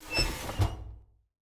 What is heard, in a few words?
open unit, vehicles; Civ